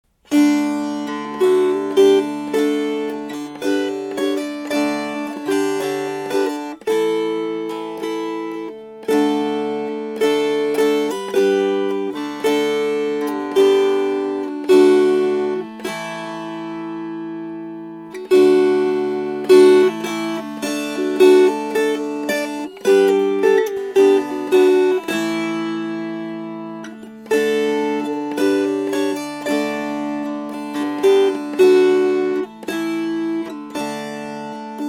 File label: O God Beneath Thy Guiding Hand Strummed